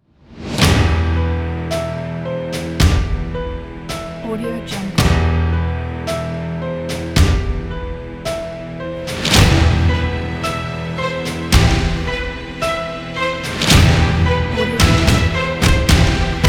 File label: dark action cinematic logo